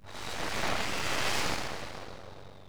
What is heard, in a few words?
missile